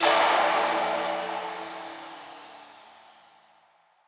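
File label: big win initial; netent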